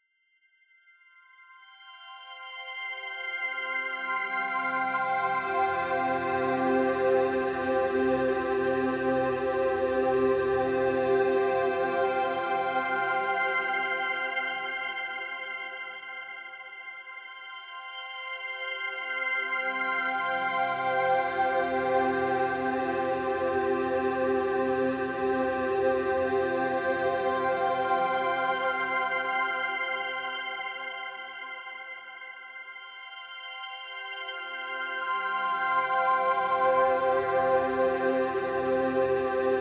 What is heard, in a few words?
Chord Ramp C; Martian Chess Audio